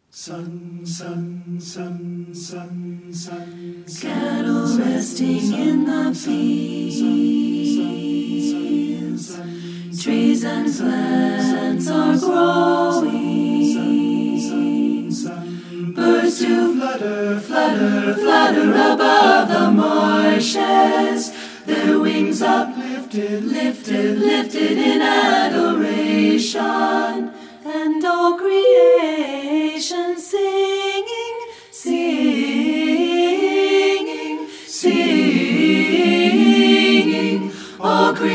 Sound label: a cappella